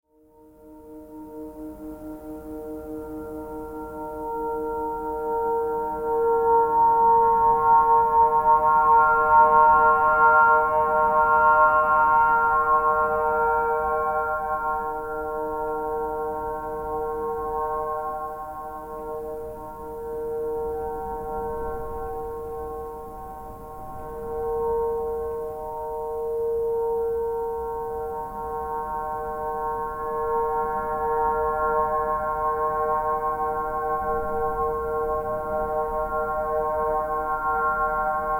aeolian

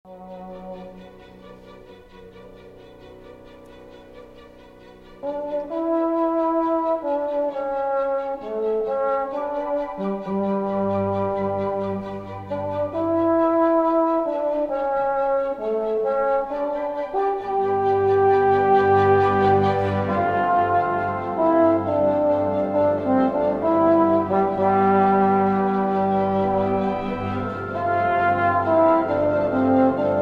serpent

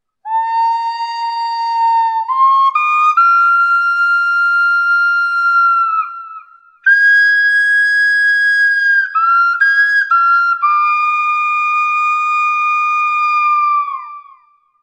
eagle

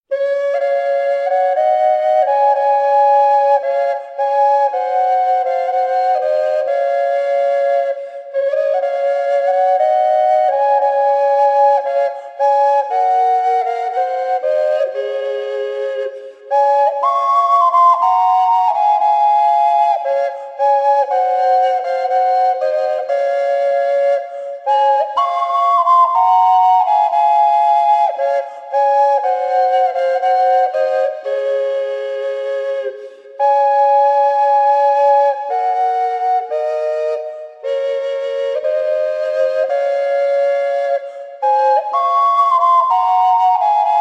duet ocarina

